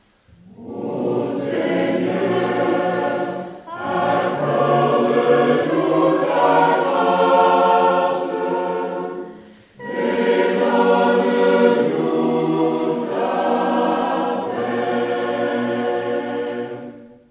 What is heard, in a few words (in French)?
Tutti; O Seigneur accorde nous ta grace